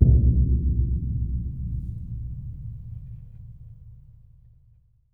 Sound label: Concert Bass Drum; Percussion; Cymatics - Infinity Beta Pack 2.0; Zeus Percussion Collection